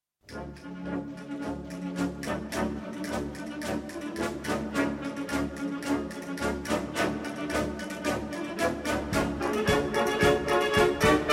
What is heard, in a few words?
Spanish Gypsy Dance; Jubilee